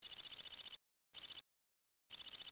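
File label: cockpit screen loop, ambience; Star Wars Jedi Knight II Jedi Outcast; XBOX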